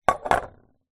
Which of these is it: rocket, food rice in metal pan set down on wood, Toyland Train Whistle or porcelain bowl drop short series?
food rice in metal pan set down on wood